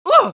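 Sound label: pain sharp, Spanish; Scout; Voice file from Team Fortress 2 Spanish version.; Scout audio responses/es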